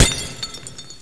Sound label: hit glass